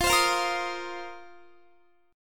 F 7th Suspended 4th, Guitar; F7sus4; 4E,4A,5D,5G,5C,6F; strummed

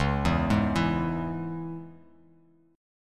C sharp Minor C sharp, chord; arpeggiated; 9,7,6,9; JGuitar

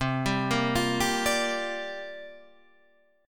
C 7th Suspended 2nd Suspended 4th C down

